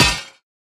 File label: Prize Egg Tap Gold; Angry Birds Seasons